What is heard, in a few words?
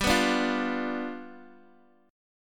G 7th G down Guitar Open D